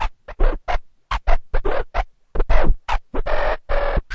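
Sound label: turntable transformer, Scratching and Turntablism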